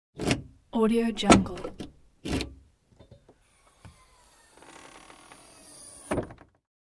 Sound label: car trunk